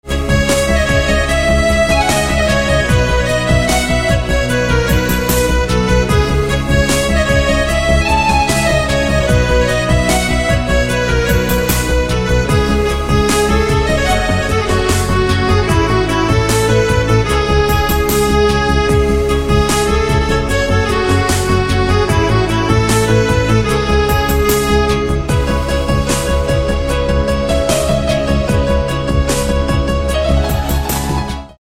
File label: Accordion